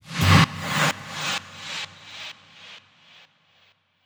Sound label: FX Impact